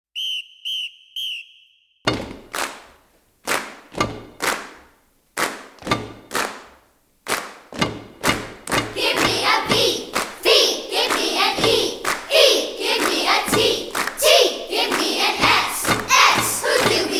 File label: Kid Perc And Vox